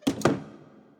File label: Door Close